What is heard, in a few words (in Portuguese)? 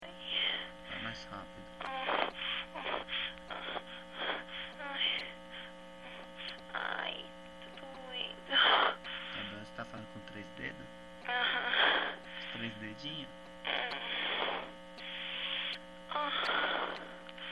gemidos, gemidos de mulher